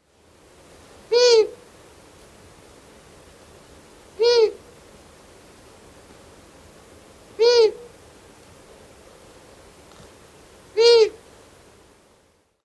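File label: Long-eared Owl harsh hoots female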